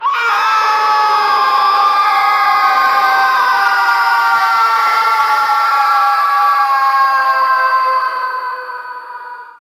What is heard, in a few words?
goblins screa; hell